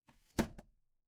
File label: impact slam